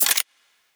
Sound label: Weapon Foley; Robobeat